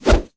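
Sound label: Attack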